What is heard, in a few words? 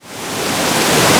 waterfall open source start